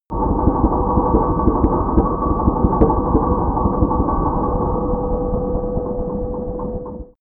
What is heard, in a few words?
sci-fi deep sea, atmosphaeren; soundpool